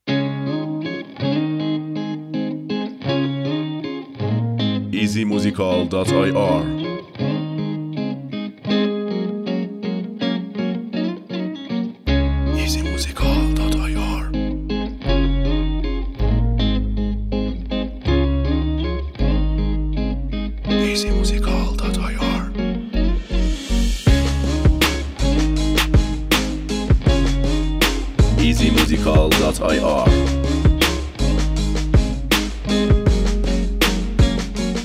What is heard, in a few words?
Beat